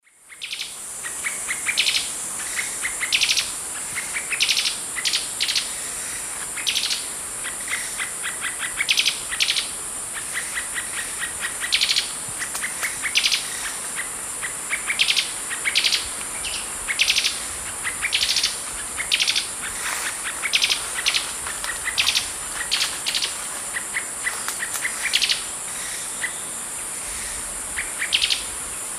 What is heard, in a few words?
Thamnomanes caesius, call; S. America; Eastern Lowlands